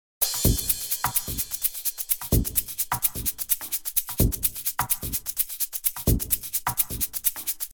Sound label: All Perc